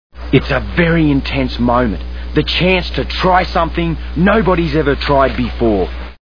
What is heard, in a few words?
intense; Crocodile Hunter